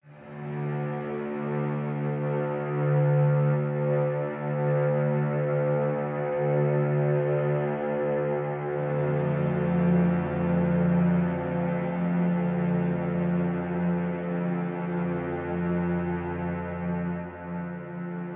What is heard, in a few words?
String